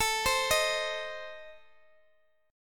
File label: A Diminished A Guitar Standard, chord; arpeggiated; JGuitar